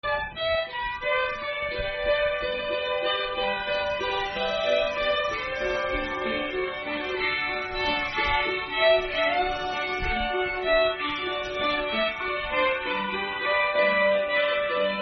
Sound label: Waltz